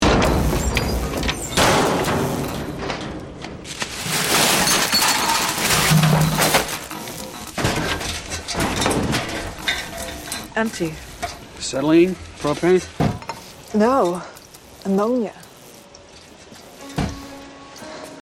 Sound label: morse